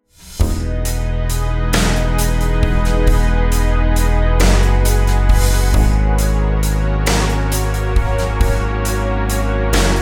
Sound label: rhythms compound 8s w16s